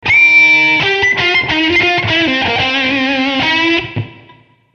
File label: C 90 105 blues lead